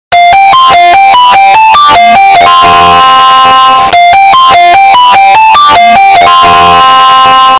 Call line

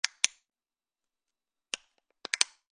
Pouring shampoo; ریختن شامپو

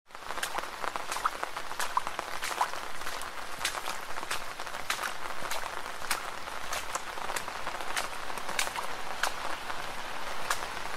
Running in rain

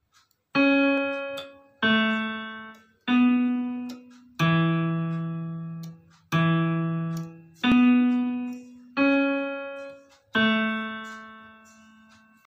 Japanese school bell

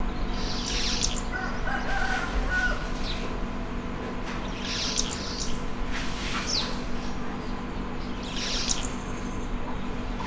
Mascarene martin

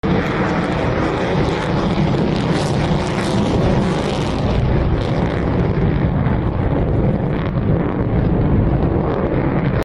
Chengdu J 20; جت جنگنده چینی

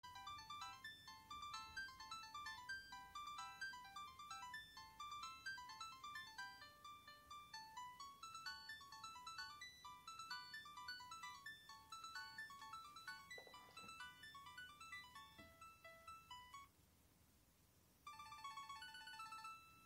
qtek ringtone

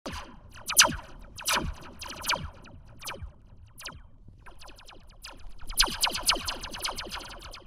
Metal wire

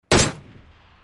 Dp 28 gun shot sounds; Pubg

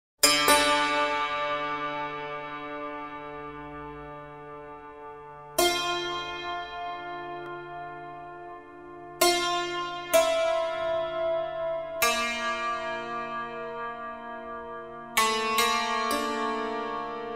Ancient Greek music; موسیقی یونان باستان

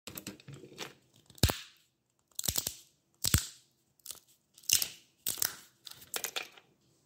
cracking walnuts; شکستن گردو

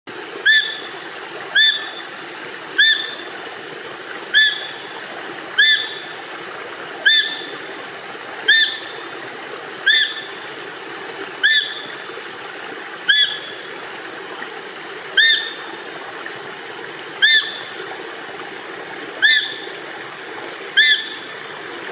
Eurasian otter; شنگ اوراسیایی

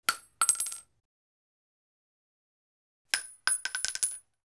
Bullet Shell Ejects

